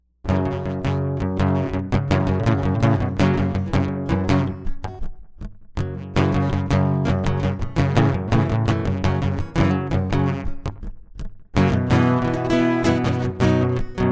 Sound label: acoustic